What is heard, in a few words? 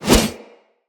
Sword Whoosh; Weapons; Swords; Dendrit Sword And Board